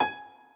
Snake Piano; Feel

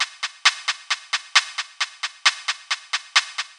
Metronome Beat; Feel; FeelDemos

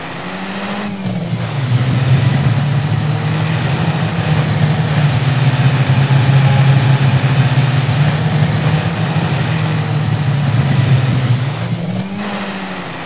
Falcon Engine Sound; Feel; FeelDemosHDRP

